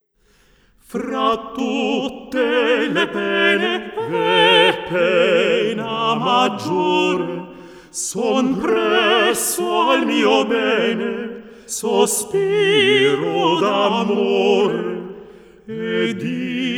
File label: Mehrstimmige italienische Gesange; ''Fra tutte le pene II''; Der Glorreiche Augenblick Op.136; WoO99